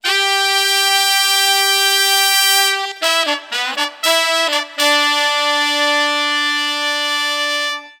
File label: 120 Roots Reg Horns G; Digidesign; Pro Tools; Pro Tools Essential Demos; Essential Loop Demos; Demo Session - World